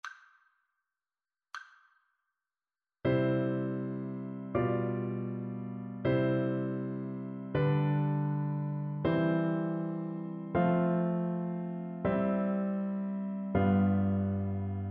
harmonic dictation

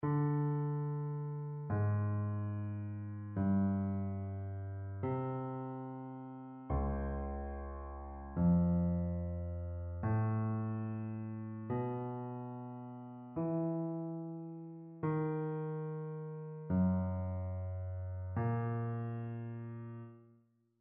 row dictation; ear training

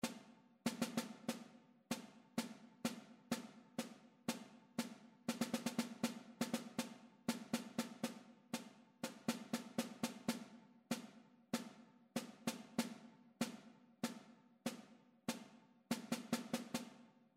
metric modulations; ear training

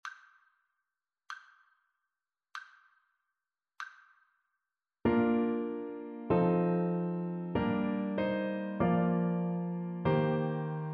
harmonic dictation

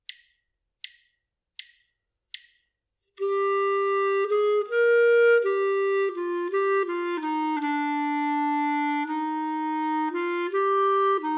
melodic dictation; eartraining

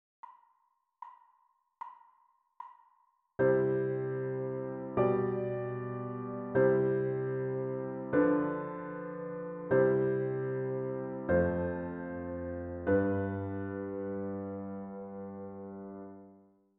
harmonic dictation; ear training

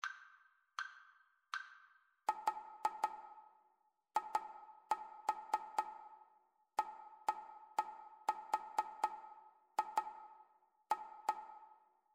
rhythmic dictation; ear training

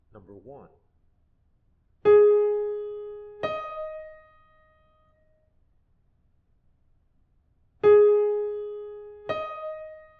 intervals

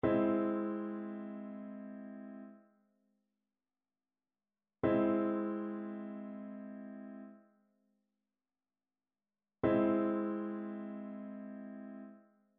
trichord; trichord identification

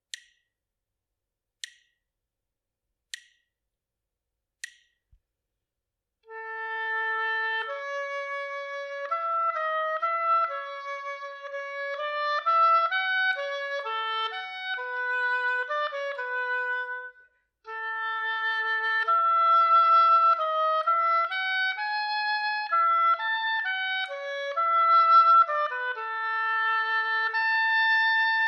melodic error detection

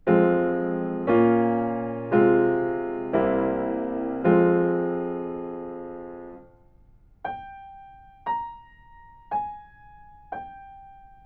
melodic fragments; ear training

